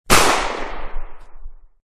gun shot

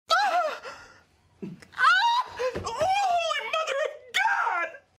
screming

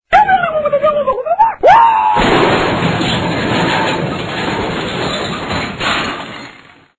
bicycle kick fail; liu kang

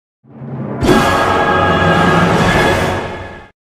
angry suspense; chikn nuggit

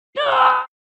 death sound; lego luke skywalker

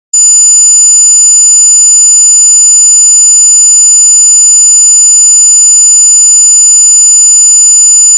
dog whistle loud

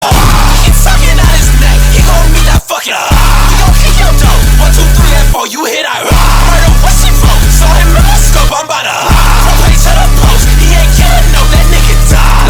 clutch music

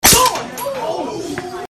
slap

